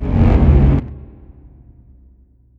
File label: spear; weapons; immortal; dota; phantom lancer